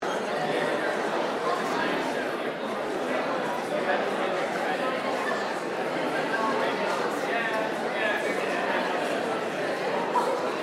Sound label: AMBIENCE CROWD INDOOR EVENT AUDITORIUM; Crowds